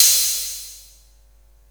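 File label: hihat open, drum machines, MFB-512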